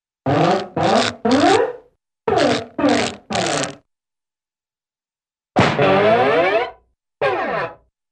Musical Shrinking w-cloth Rips - Short Smack Musical Str; Cartoon; Hollywood Edge; Cartoon Trax